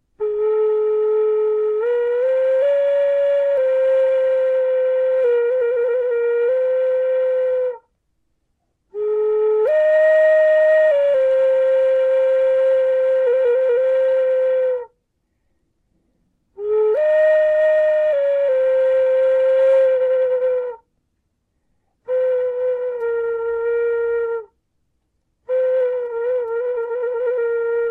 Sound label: bamboo flute